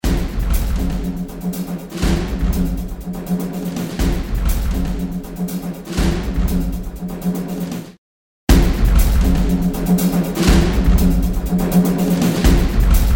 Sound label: loop